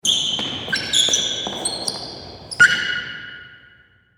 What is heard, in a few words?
Sports Gymnasium Shoe Squeaks Reverb; Basketball